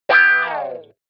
Guitar Thin Wah-Wah Finale Chord; Hollywood Edge; sound designer's toolkit v2

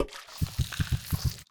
Blood Vial

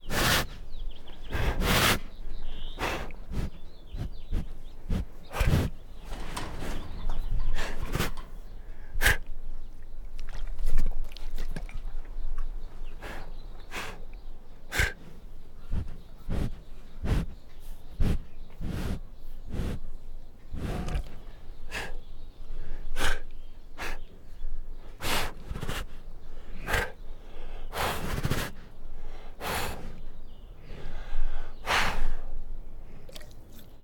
Male Bengal Tiger Breathes, Sniffs and Snorts; Tigers; Sound Ideas

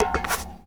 Street Ball Metal Basket; SFX Kit; Street Basketball

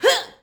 Boxing Female Punch Grunt

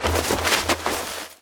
Bodyfall Snow; SFX Kit

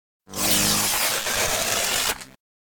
Electric Arc Short Circuit Electric Shock

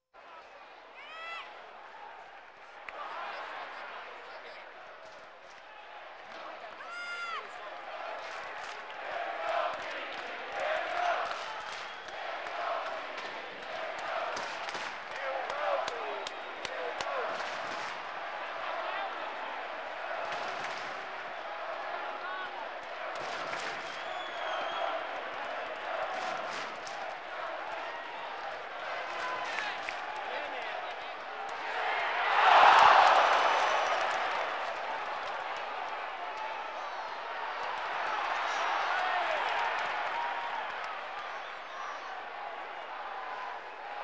Sports crowds

